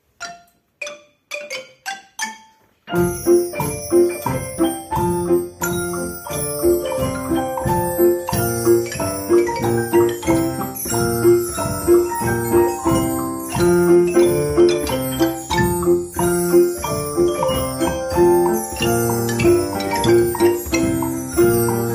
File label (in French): sonnerie